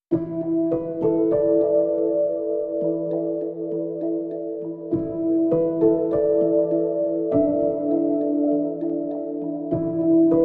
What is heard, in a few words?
Soft Landings, Underscore